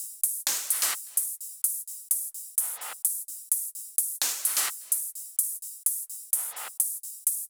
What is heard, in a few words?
128BPM Kit; Vengeance Samples; VFH1 128BPM Diesel Kit